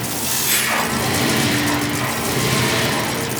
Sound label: Security Sentinel Loop; Ambience; Gameplay; Orokin Moon; Warframe